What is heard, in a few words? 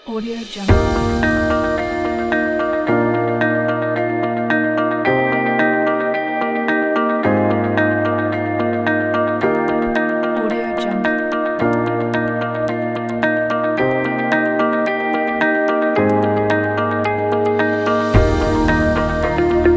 aspiring emotional ambient background; soundtouch